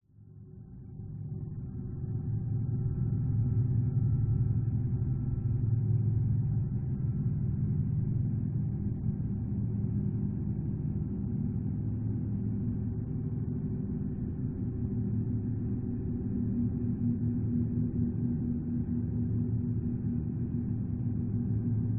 science fiction drone deep dark ominous mid shrill; zapsplat